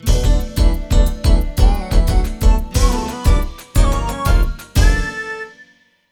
Game Over; Goal Storm; World Soccer Winning Eleven; PS1

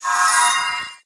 Board Charge Ready; Subway Surfers City; Android, iOS

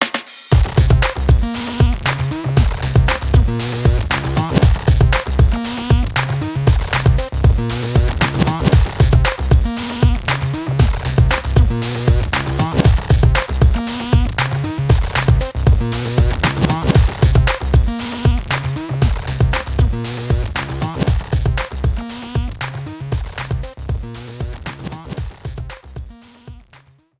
Music event; Power Pro Kun Pocket R

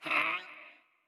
WindUp; Wimp

